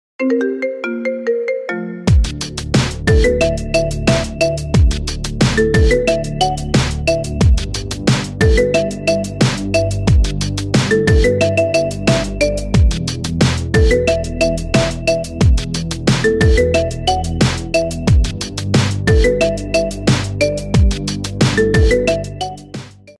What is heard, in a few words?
iPhone